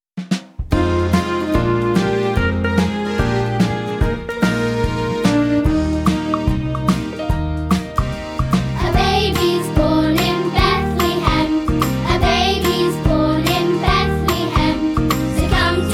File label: Vocal